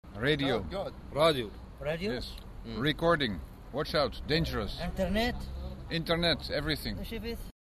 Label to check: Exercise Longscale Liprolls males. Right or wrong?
Wrong